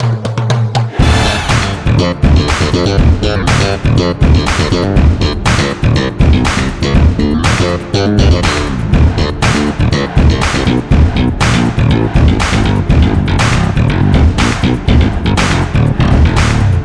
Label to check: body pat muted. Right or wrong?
Wrong